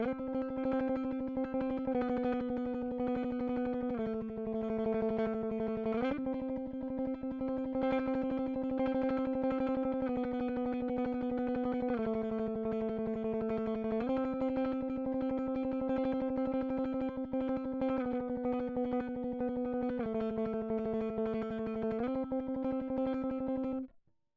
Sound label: electric